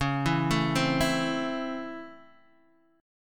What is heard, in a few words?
C sharp Minor Major 7th Sharp 5th C sharp Guitar Open D, chord